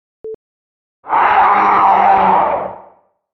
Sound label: Werewolf